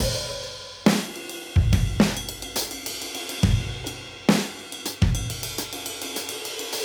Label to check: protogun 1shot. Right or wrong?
Wrong